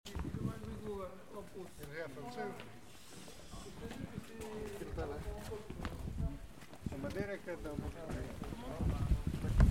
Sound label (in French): AMBIANCE VOIX AU LOINTAIN